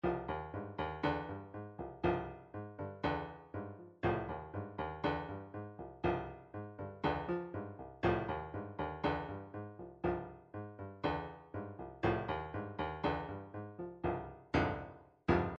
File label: midi drums